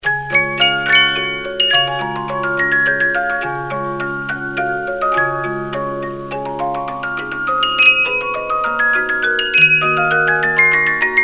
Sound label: Waltz, 72-Note Movements, music box; Waltz of the Flowers